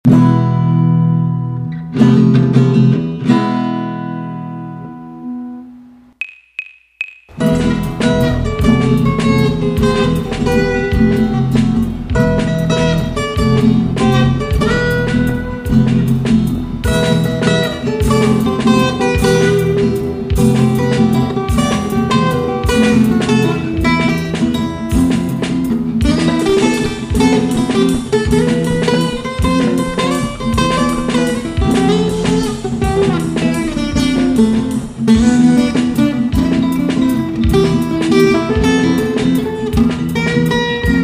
batalha medieval violao

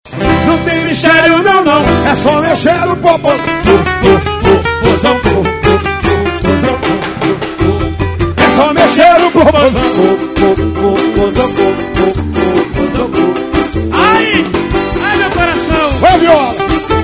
samba

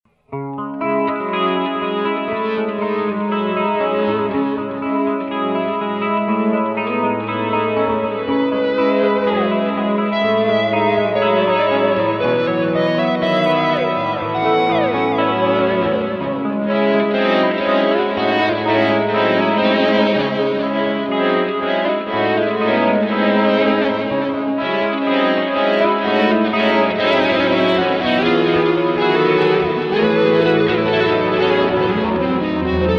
quarteto de guitarras

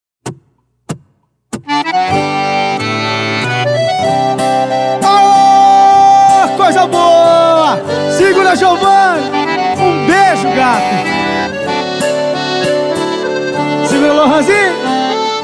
modão

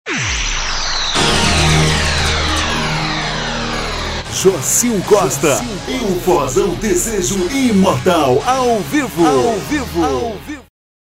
abertura

